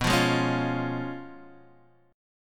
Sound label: Db Major B; strummed; JGuitar